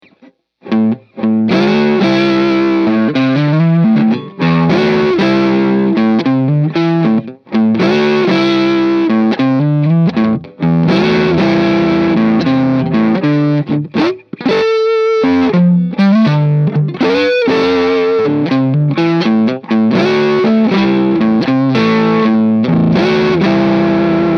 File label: Blues, amps